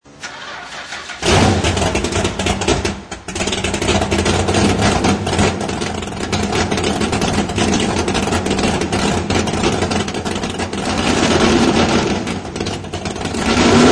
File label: hemi